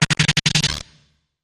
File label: Short Gritty Stutter